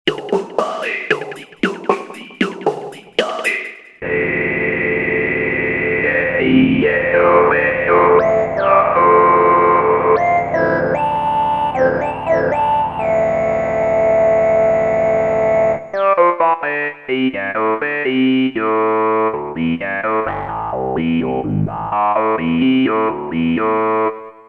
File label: voder, ensoniq asr 10